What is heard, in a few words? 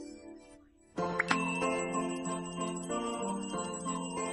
sparkling blink blink